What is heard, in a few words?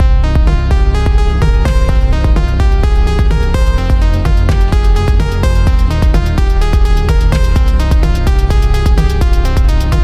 synth full context